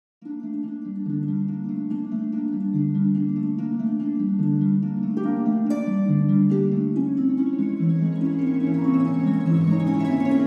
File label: HARP